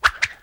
natures grasp layer; treant

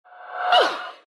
pain; dota; death prophet

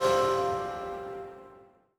gift item received

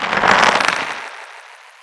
bramble spawn; weapons; dota; dark willow

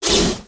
preattack; dota; dark seer

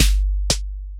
ult click track; weapons; dota; largo

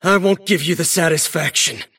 deny pain; davion; dota

